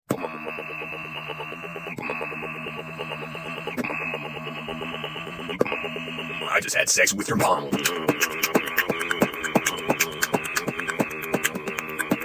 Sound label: beatbox